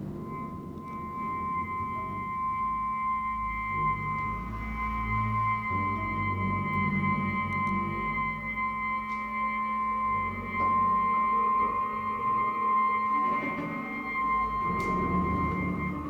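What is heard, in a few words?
clarinet multiphonics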